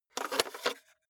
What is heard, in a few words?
cassette out of cassette player soft